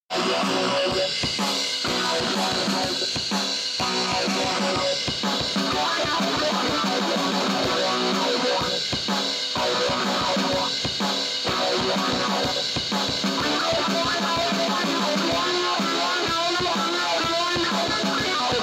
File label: 160BPM B Minor; song in a hat 2025